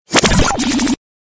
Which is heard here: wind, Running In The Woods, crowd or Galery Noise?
Galery Noise